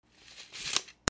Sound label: RIPPING